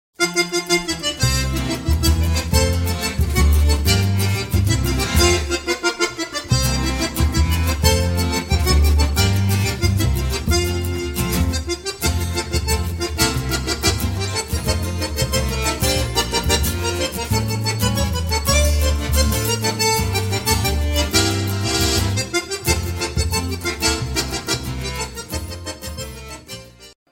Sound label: samba